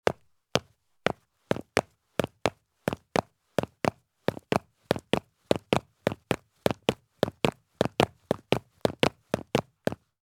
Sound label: Heavy Work Boots Descend Quickly with Gallop Down Concrete Stairs; Sound Ideas; Footstep Sound Effects